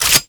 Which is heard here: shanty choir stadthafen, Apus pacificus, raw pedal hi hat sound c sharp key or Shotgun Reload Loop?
Shotgun Reload Loop